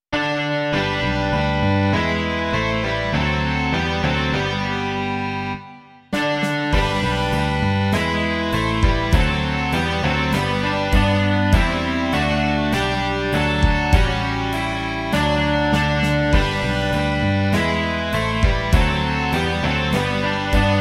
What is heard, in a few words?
rock